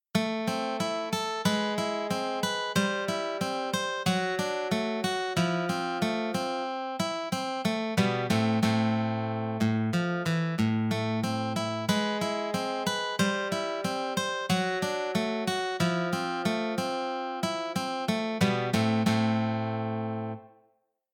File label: acoustic, Strum GS2; AAS; Aplied Acoustics Systems